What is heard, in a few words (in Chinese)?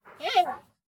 熊猫待机声